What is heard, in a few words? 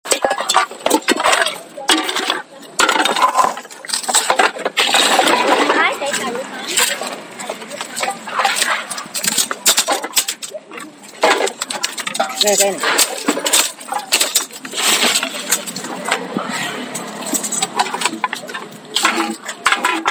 thu gom nhôm nhựa Collecting cans